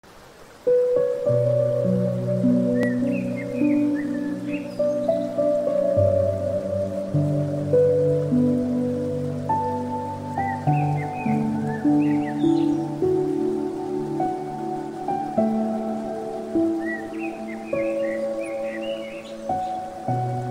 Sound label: bird and piano